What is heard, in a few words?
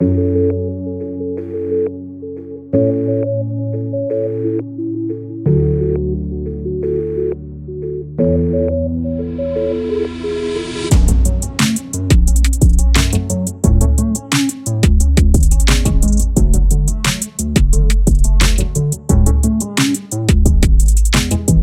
sad piano melody